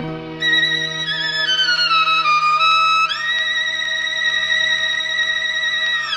strings tension, Sad Loops

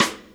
Rimshot, Snares

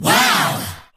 "wow" crowd; ooh ahh

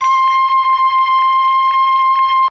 belled, Synths; Notes

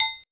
Clavas; Misc Percussion

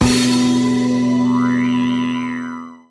string to synth, Stabs

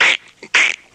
choking; ooh ahh

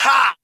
"ha", Voice

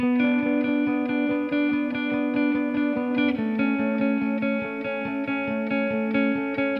guitar, Loops, Sad

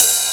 Hats Electro Open

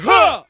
"huh"

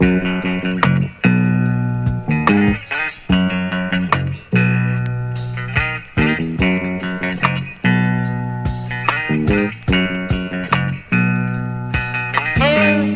guitar and sax, Phonky Loops

